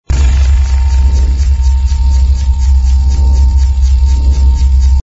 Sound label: Laser